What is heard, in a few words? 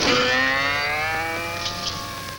zzzzoooiiing; Three Stooges